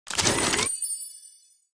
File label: Upgrade